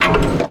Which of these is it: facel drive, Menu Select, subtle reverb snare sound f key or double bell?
Menu Select